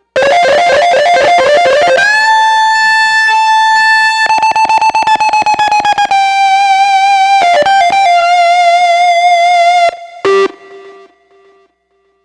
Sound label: Grunge Lead; Dr. Fink's Funk Factory